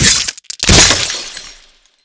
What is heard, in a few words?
icicle sticky impact break, frost; skyrim